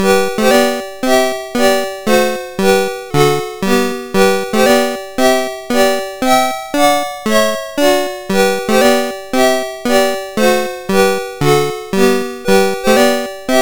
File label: March, sid MOS6581R4; Master Composer; Soldiers March